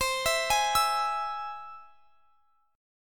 C Augmented down; arpeggiated

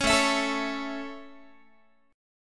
A Major, Guitar; strummed

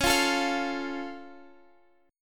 Db Augmented Db down Guitar Half Step Down

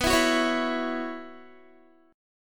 B Suspended 2nd Suspended 4th B, Guitar; strummed; 4E,4A,5D,5G,5C,6F; 19,19,16,18,16,x

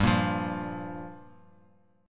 G Minor 7th, Bass Standard; Gm7 strummed; JGuitar

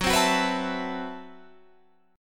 A Minor 6th Add 9th Gb, Chord

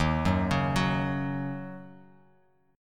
D sharp Minor 7th

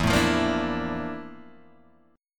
F Major 13th E, guitar chord

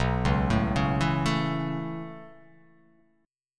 B 7th Suspended 2nd Suspended 4th down, chord

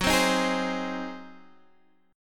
G sharp 7th F sharp

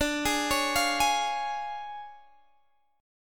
D Minor Major 7th Flat 5th D Guitar Open D

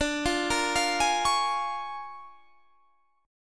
Bb 7th Sharp 9th D

